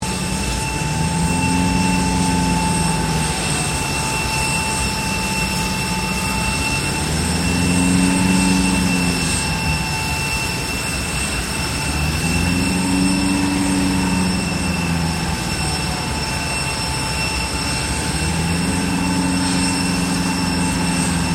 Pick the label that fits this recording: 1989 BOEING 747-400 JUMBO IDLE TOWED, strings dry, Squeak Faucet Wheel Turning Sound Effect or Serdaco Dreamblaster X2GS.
1989 BOEING 747-400 JUMBO IDLE TOWED